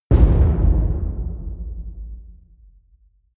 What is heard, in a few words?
wood hit large table